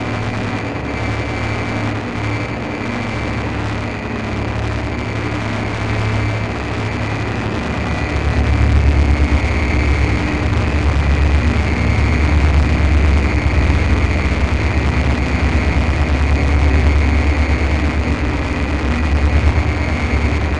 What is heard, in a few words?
Grainy Electric Shield; Loops; Texture; Ableton Live 12 Lite; Core Library